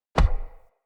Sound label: Decoy Bow Shot Punch; Powers; Fey; Saboteur